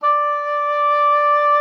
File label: D5, OB D.AMORE; Xsample VOL-7